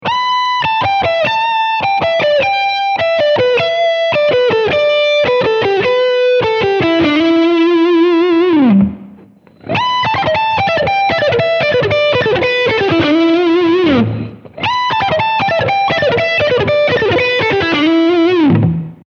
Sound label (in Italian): accelerazioni 2corde discendente